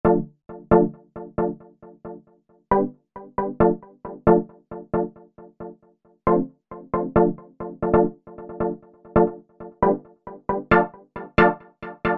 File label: SYNTH TEXTURES Delays; Devja Delays; Apple GarageBand 2